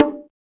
HRD BGO OP LO, BONGOS; Drums In Your FACE!

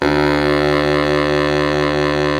SAX Bass Sax D 2

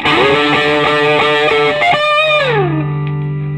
SOLO, Vintage Blues Guitar

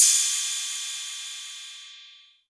Cymbal, Novation Drumstation; Novation DrumstationV1

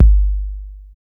808 THUDK F; Drums In Your FACE!

